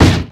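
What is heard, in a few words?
wood box hit; XBOX; Freestyle Street Soccer; In Game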